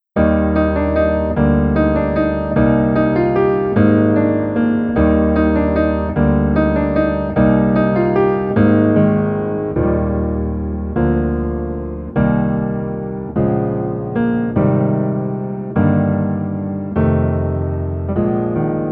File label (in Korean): Piano ver 남자 키